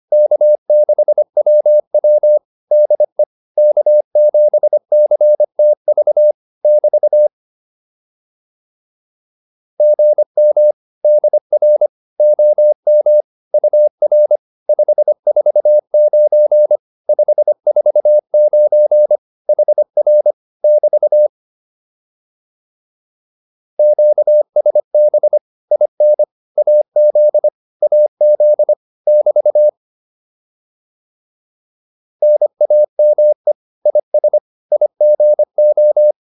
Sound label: QSO, advanced practice files